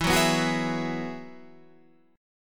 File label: Ab Minor 7th Sharp 5th